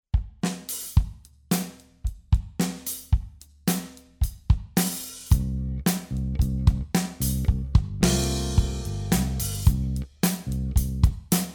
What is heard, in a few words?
Pop, Drum Fills; Essential Drum Fills (Erskine)